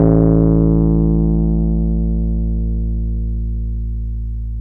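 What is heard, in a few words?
piano, Wurly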